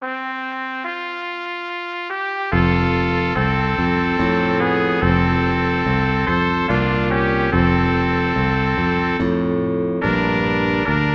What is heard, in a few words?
noble slow waltz